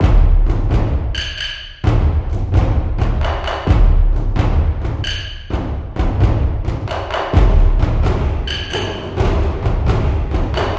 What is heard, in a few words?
Taiko Percussion